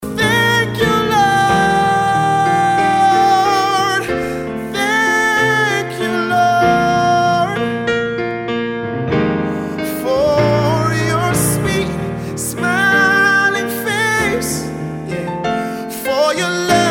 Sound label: Thank You Lord ring tone